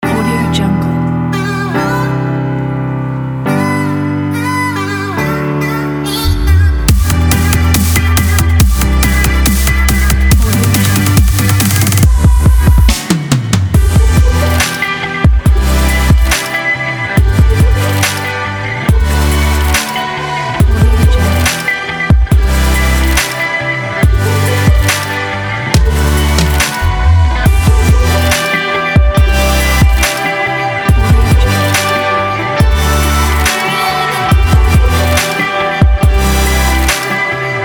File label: abstract future